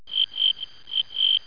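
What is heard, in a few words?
cricket chirping; kunchong jiaosheng; xishuai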